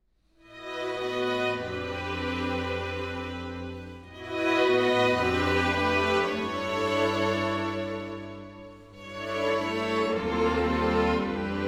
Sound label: Preludes in A Major arr. for saxophone